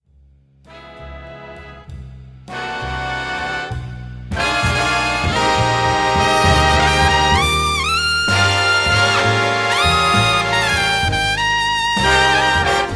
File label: trumpet